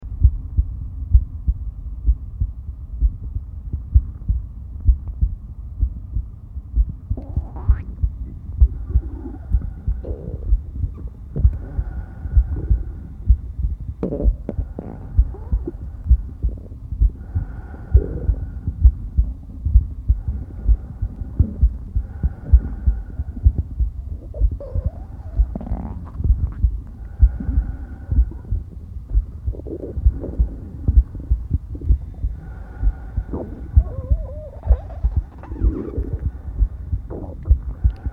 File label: womb tone